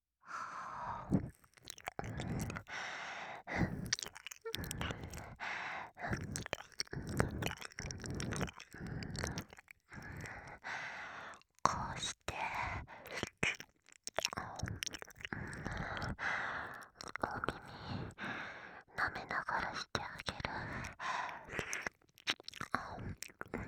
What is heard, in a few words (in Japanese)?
耳舐め だしてだして 左